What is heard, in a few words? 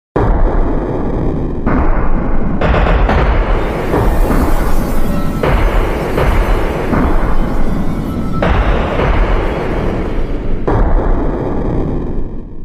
Thunder Lightning